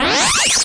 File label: Electro FX; Ueb HE Electro FX